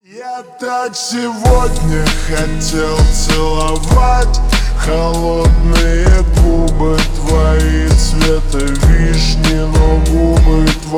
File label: slowed reverb